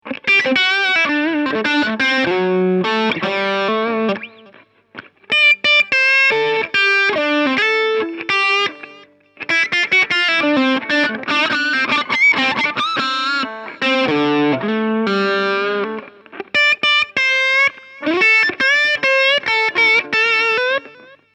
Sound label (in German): steg verzerrt